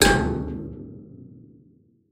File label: Tube Hit; Game rip; MiSide